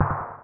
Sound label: small explosion; Powermanga